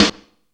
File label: rockin, snares; Zero-G - Funky Elements